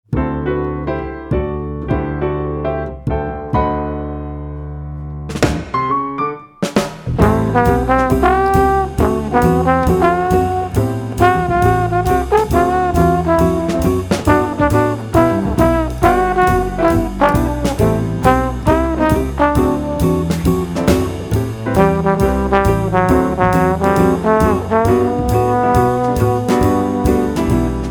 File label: Trombone Ver.; Missing 9; Korea